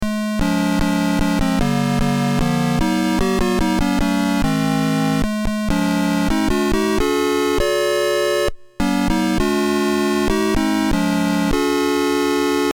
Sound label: National Anthem